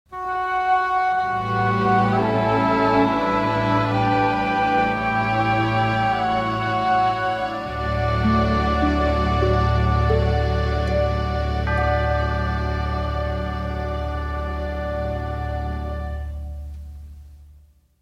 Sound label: Prayer Response - Band Track